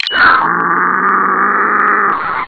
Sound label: zombine charge; scmod